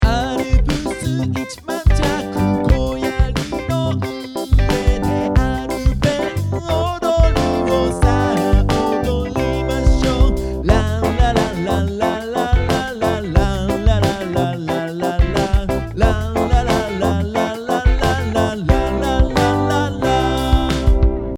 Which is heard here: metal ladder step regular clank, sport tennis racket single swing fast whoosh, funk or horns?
funk